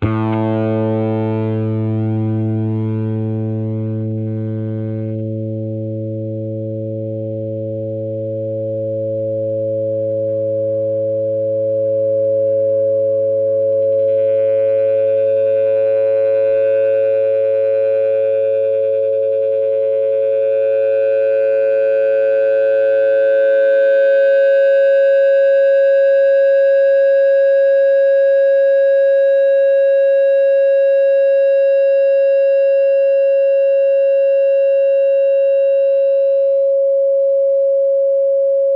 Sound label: Anechoic recording A string feedback amp output